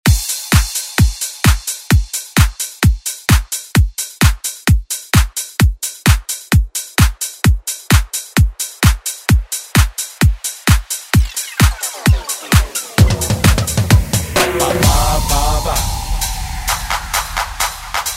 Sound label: Salsa; 2023 ReDrum